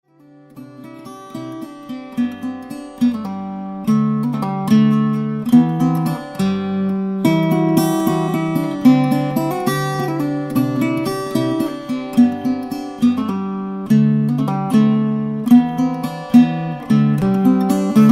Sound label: Waltz